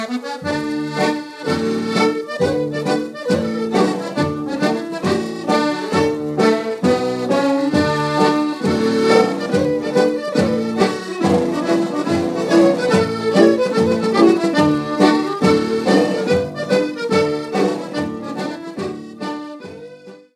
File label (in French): Canadian Barn Dance - Ecosse